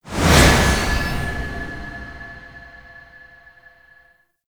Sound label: Stadium Stage Group Transition; Gameplay; Warframe; Nineteen Ninety Nine